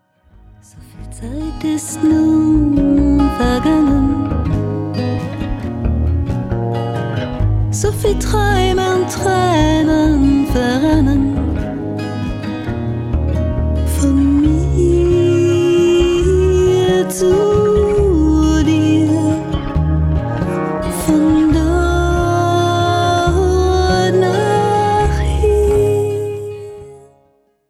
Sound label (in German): Vergebungslied